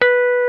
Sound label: Jazz Guitar Emperor; Club-50 - Foundations